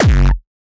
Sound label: dirty kick